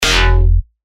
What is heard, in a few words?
F, bass shots; Spiral; revealed bass shots vol 3